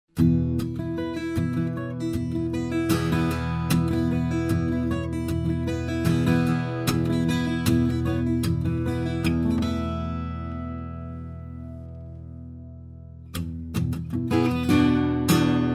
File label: G noodling